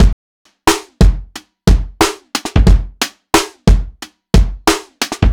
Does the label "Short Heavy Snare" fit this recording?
Yes